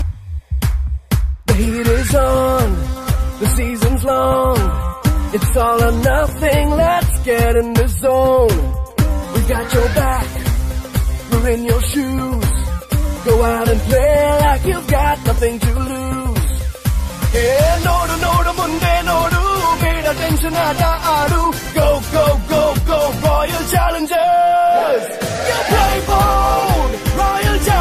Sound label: Team Song Ringtone; IPL 2018; RCB Team